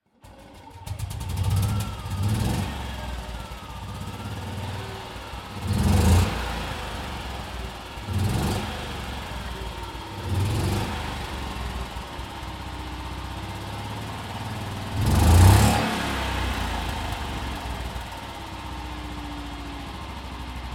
BMW Isetta 300

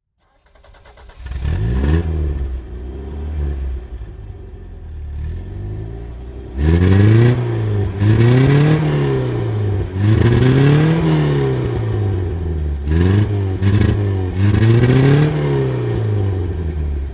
Toyota Celica

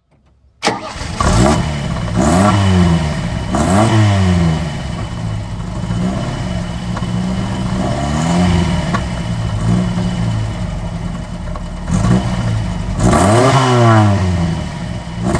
Ford Fiesta 1100 X

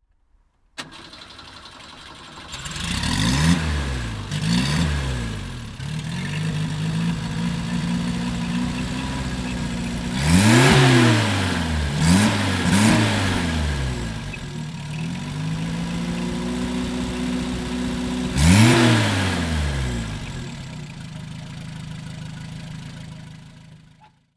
Renault Caravelle 1100

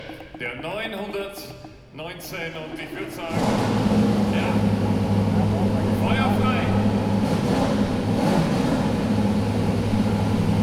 Porsche 919 Hybrid; Porsche Soundnacht 2018

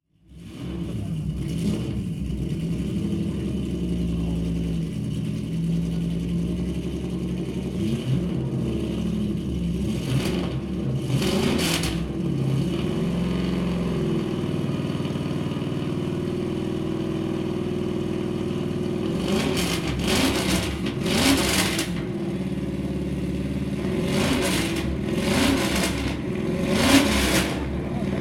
Porsche 928; Rennlegenden erwachen und kreischen; Porsche Soundnacht 2015

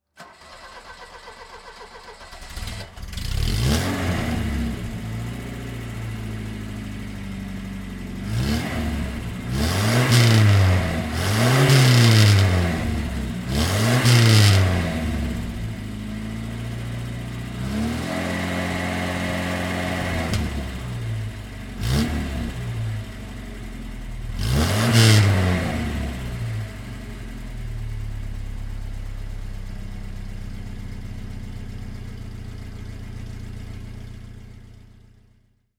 Opel Rekord

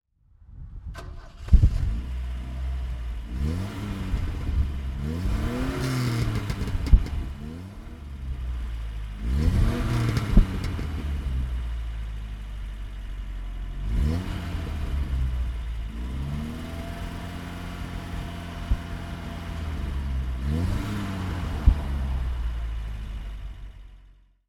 Opel Manta

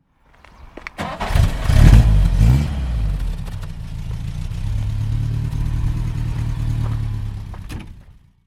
Mercedes-Benz 190 SL

